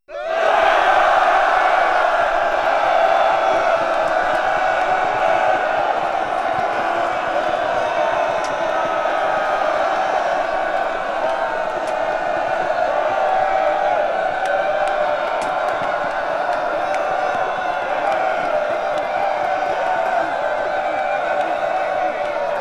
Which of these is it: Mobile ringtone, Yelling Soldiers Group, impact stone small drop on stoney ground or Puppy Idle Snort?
Yelling Soldiers Group